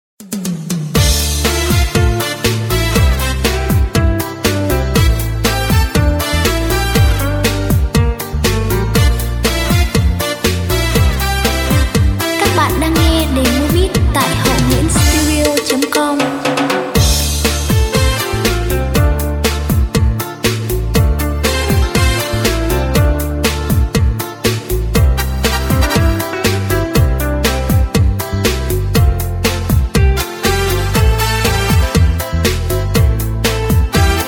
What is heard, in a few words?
beat, playback